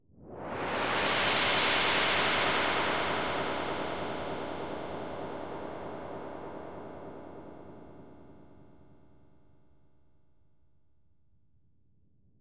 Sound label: Woosh Sci Fi